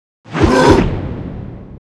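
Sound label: Mob attack; Monster; 묘족; 양왕; 2단계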